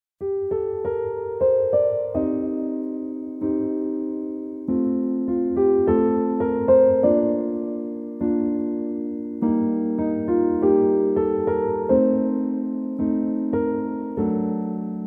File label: Adagio (Piano Version)